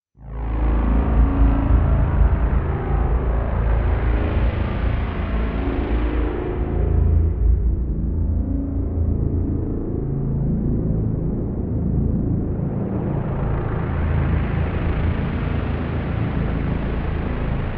Dark Drone

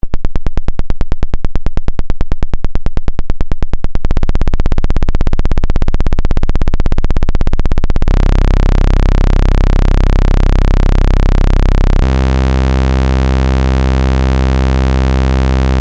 Pulse 2 Osc 1 Saw

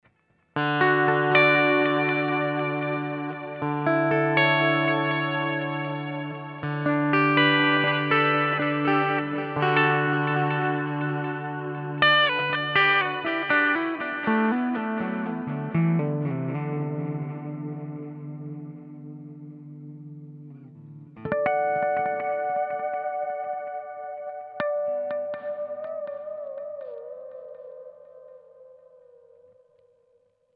GFI System Orca Delay Diffused Space

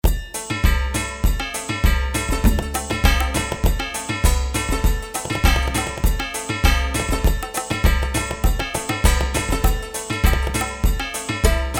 ethno; JourneyMan

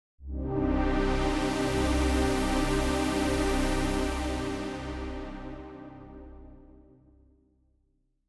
12dB Waldorf Filter

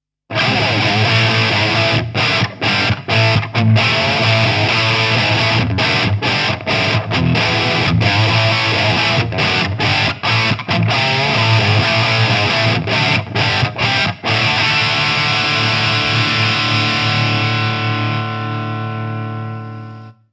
EVH Wolfgang Standard Lead

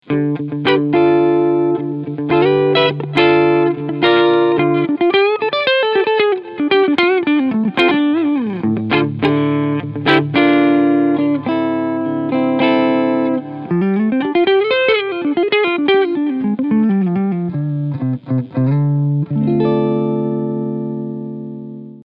Cleansound Front PU

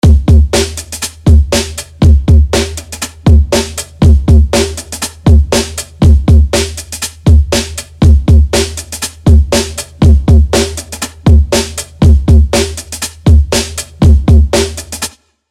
Drums Flex Bounce

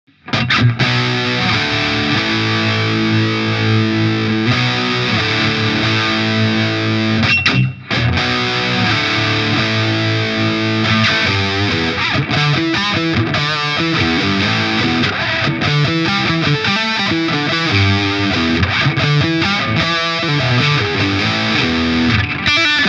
Orange Super Crush 100 Gain 100

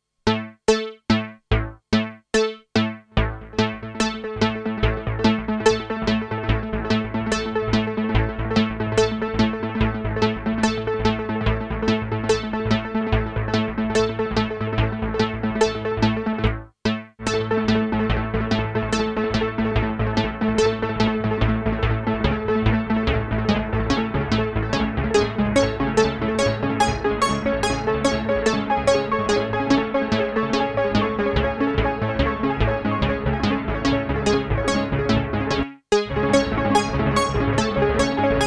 Div Pattern Synth; Strymon Time Line

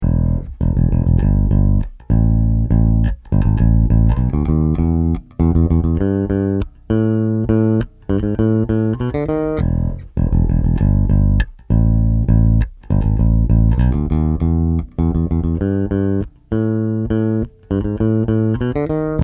scrambler

